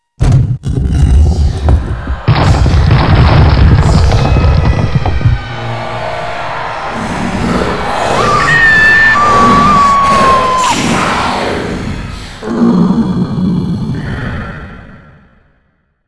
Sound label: surprise; cs wolfenstein